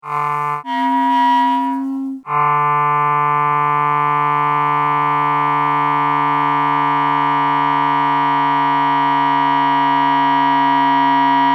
Multiphonics Morph

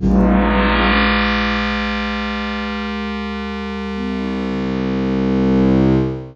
morph Gong Minimoog

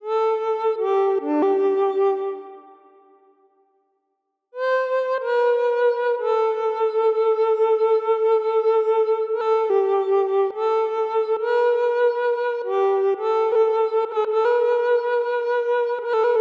rast synth, makam; Perde

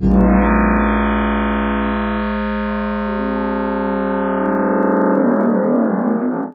morph Gong Lion

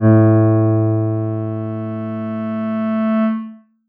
morph Piano Clarinet

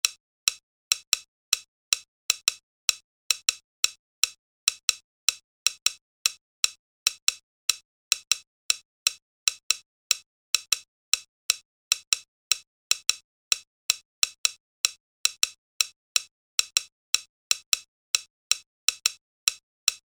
Tick Phase

